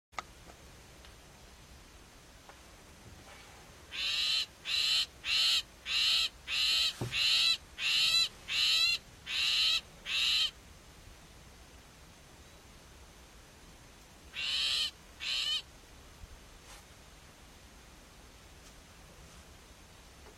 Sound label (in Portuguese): Canto do picanco cinzento